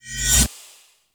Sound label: Choir Eyes End